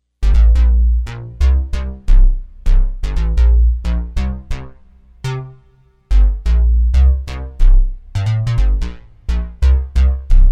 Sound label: ppgwave bass; dss1 demos